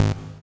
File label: SYN Poly G#; Best Service Gigapack 1